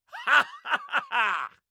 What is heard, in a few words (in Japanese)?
ハッハハハー！